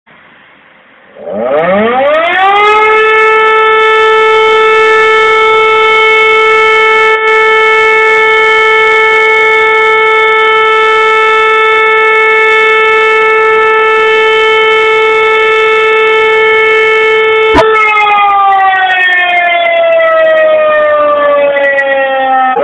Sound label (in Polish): syrena alarmowa nalot